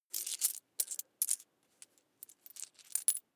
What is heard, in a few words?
coins search push